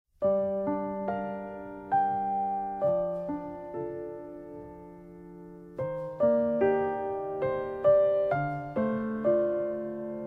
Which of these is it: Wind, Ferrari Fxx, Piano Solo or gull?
Piano Solo